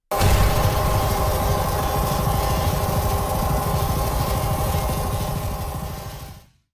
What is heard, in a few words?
dark energy hit; soundscrate